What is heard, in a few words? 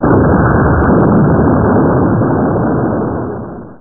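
expl 1, explosion; cyberdrone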